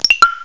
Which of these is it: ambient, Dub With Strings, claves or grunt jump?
claves